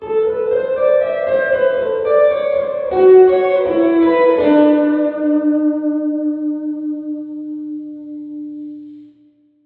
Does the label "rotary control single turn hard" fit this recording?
No